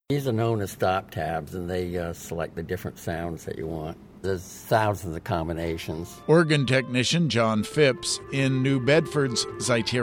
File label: Organ tech